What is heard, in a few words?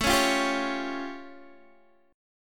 A Augmented 7th, Guitar DADGAD; chord